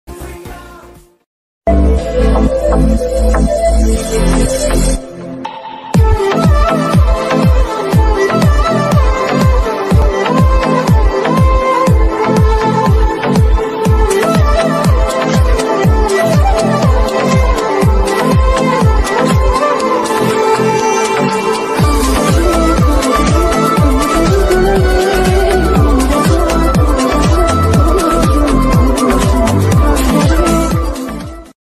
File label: bgm love ringtone bgm mix; 96 movie